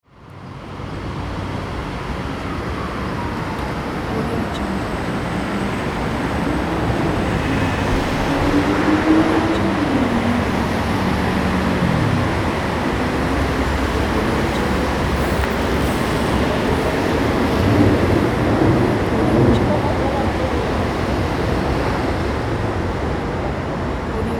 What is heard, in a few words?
city